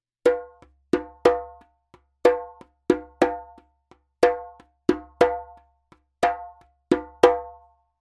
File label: djembe; djembebog oevelse